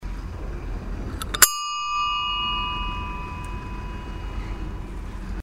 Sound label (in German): fahrradklingel